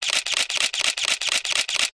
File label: camera dslr take picture multiple fast fashion shoot nikon d5200